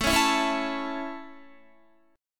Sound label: A Major, Guitar; strummed